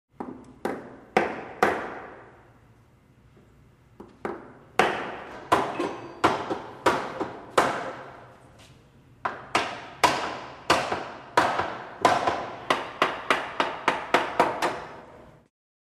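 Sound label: Hammer Nail Wood Interior; hammers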